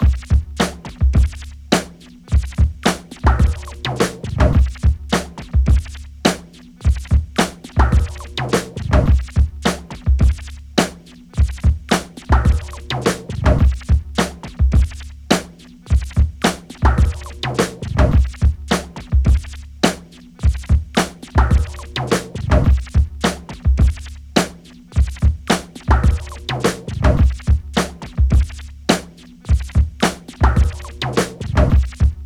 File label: 106 Tommy Whitenoise, Breaks; Lockdown Breaks